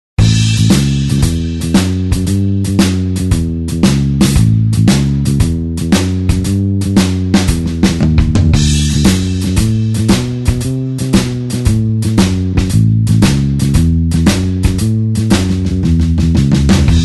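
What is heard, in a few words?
blues chicago shuffle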